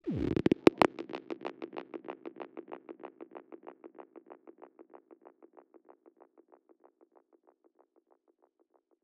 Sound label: Squelch F# 142 BPM, Psytrance; Ghosthack; Psytrance Visions Volume 2